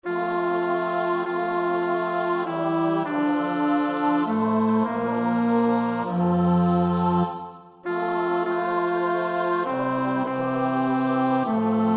bas; Nieuw Liedboek; NLB 244 (Nu is de dag ten einde); Vesperkoor Bunschoten-Spakenburg

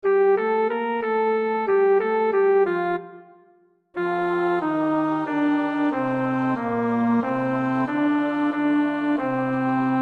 Sursum corda (bas); Darke (Harold E); Communion Service in F; Oefenbestanden

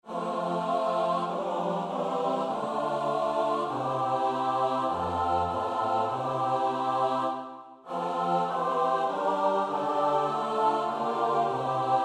(S-A-T-B), Oefenbestanden

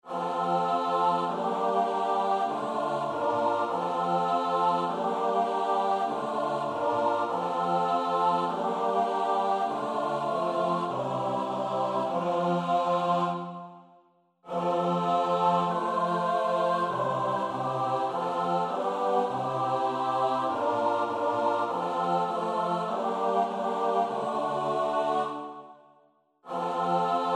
S-A-T-B; Nieuw Liedboek; NLB 75

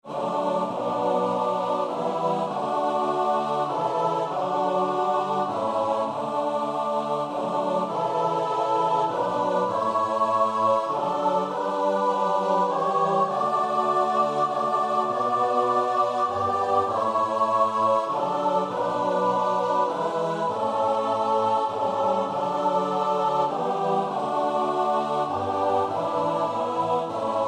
S-A-T-B; Nieuw Liedboek; NLB 665

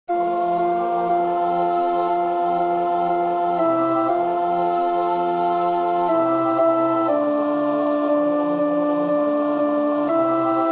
Notre Père (alt); Duruflé (Maurice); Oefenbestanden

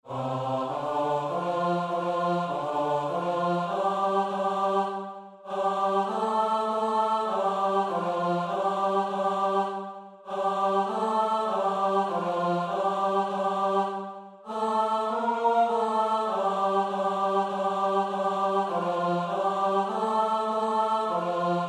Onze Vader (S-A-T-B); Nieuw Liedboek; NLB 369b; Oefenbestanden

